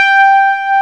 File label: Moog, g6; BGM; Nintendo; July 2020 Gigaleak